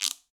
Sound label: Guiro A Down; Latin Percussion; Drum Hits; Wood; Ableton; Factory Packs